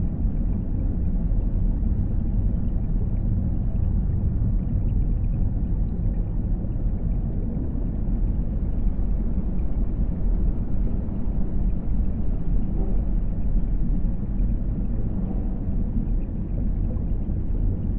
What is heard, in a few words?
ship colony ship idle; aquatics